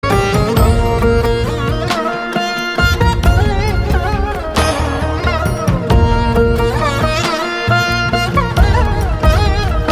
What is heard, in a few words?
BGM, Telugu; Varudu Kaavalenu